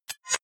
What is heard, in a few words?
hover fast hard; maschinen; signale; soundpool